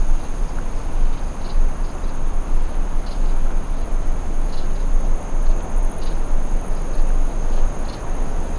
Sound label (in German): draussen heuschrecke; ottelo